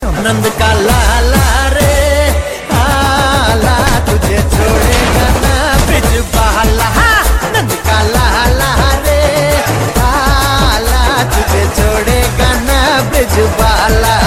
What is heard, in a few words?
song ringtone